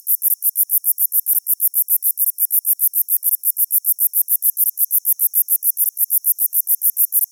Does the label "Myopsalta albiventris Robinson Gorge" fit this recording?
Yes